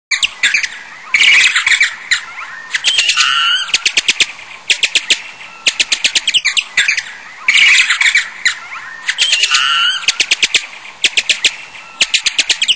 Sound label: bat sounds